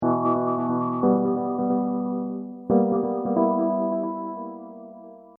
Hip Hop Dark Rhodes Licks 90 Bpm Swing E; Keyboard Loops; Lynne Music

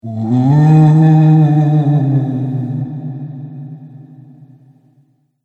Ghost Spooky Moan; Ghost Sound Effects; horror

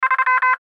Radio Morse Code; Morse Code Number Three; Warfare; Blastwave

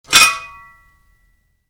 Shovel: Pick Up; Yard Tool Sound Effects; Yard Tools (Sound Effects:Tools); Spade pickup

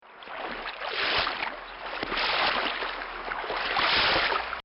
Walking In Water; Nature

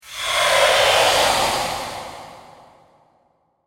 Deathly Breath, scary breathing

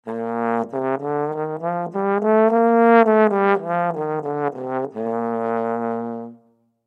Trombone, Trombone Bb Maj Scale Ascend Descend; Brass; Blastwave

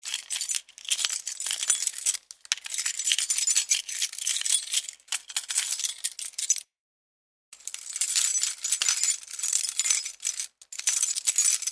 Jangling of car keys

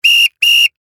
Sports Whistle Blow Twice Referee; Whistles; Objects; SFX Bible

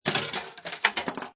Pinball Sound Ball Launched Rolls And Clanks; Video Game Sound Effects

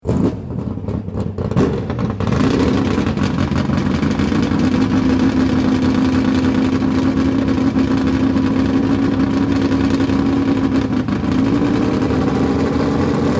Dragster Start, Engine, Revs, High, Rpm; Sports; Race Cars; Blast Wave

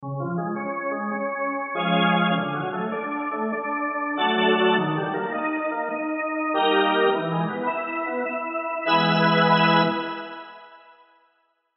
Music Organ Charge, Music Organ Charge Ascend; Music Organ Major Arpeggio Chord Stabs Ascend; Sports

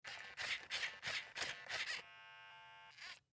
Sci Fi Servo Motor Small Robot; Sci Fi Servo Motor Small Multiple; Robots

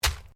ORGANIC PUNCH; Various Organic Foley sounds; Combat Swings; Sound Effects:Combat; Foundation3

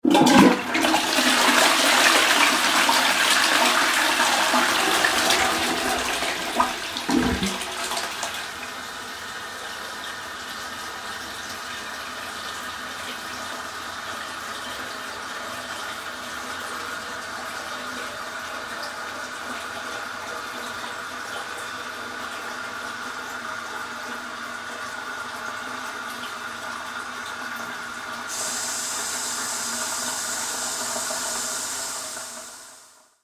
household toilet flush cistern; Toilet Flushing Followed By Cistern Filling Back Up With Water; Bathroom; McKinney Sound

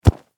Sports Soccer Ball Kick; SFX Bible 6

